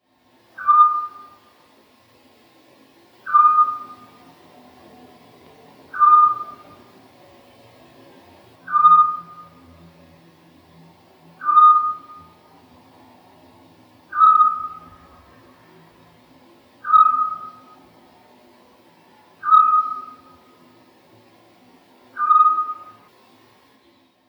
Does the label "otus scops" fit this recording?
Yes